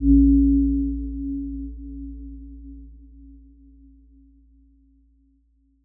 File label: Aurora C2 f; healing soundscapes; HSS-Custom-1